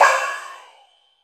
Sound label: crash L; Zero-G; Chemical Beats; CRASH ZOO